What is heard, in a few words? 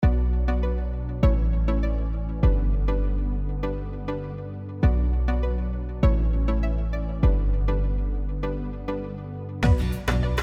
urban reggaeton sample kit